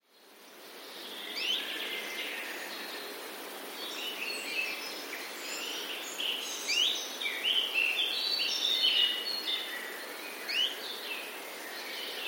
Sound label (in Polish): pierwiosnek, nawoływań i śpiewu, zrobione na dużej łączce; Pierwiosnek nie tylko śpiewa, ale też nawołuje rosnącymi gwizdami